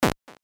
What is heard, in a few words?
punch; superPlatformLand